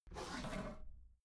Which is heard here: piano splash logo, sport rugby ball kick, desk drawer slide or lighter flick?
desk drawer slide